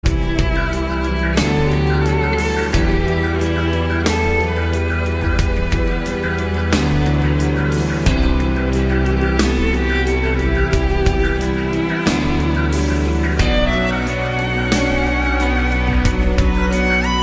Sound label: bulgarian sounds; Electronica